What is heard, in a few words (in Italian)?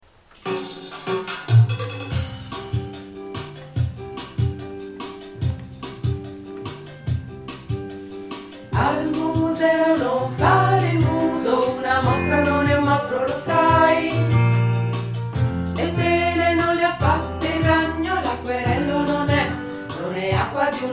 canto; museo